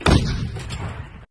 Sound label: bang click